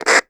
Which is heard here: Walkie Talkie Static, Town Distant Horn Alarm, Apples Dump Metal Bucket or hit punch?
Walkie Talkie Static